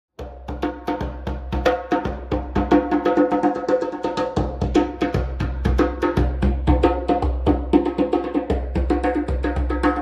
SONIDO DE DJEMBE; YEMBE

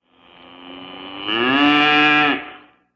vaca

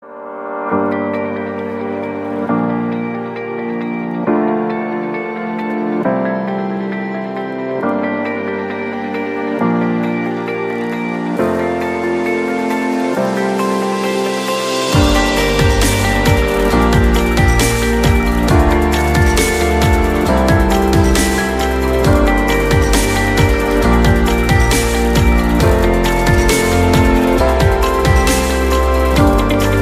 musica corporativo emociones de la tecnologia

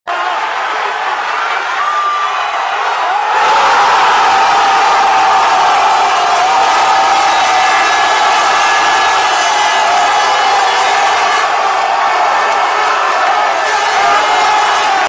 gol gol gol

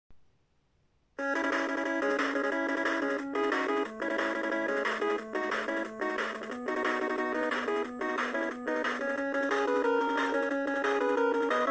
musica video

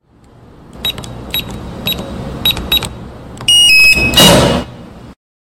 Sonido caja fuerte abierta

